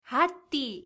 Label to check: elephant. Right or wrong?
Right